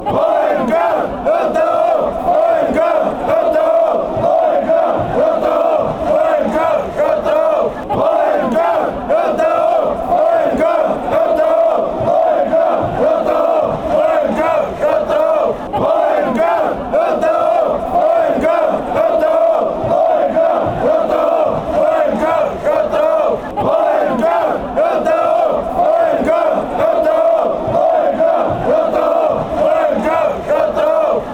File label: bohemians chant